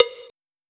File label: normal hit whistle